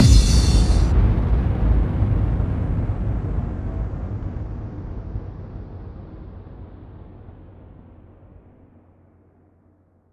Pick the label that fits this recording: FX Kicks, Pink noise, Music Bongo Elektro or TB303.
FX Kicks